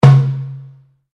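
Drum Darabuka Palm Hit forte, Egyptian Darabuka Drum; Drums; Musical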